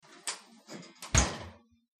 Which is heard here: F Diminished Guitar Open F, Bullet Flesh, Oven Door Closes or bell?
Oven Door Closes